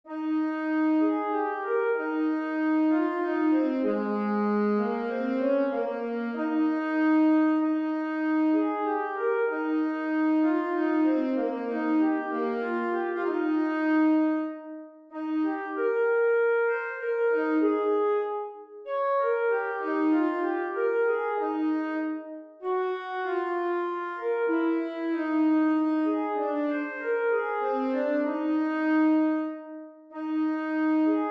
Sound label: alto bari audition